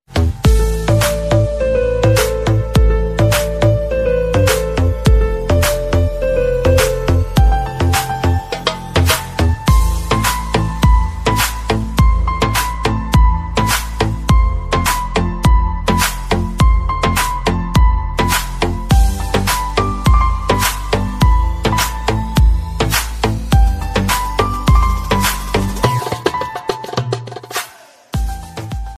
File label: romantic instrumental music tone ringtone; bollywood ringtones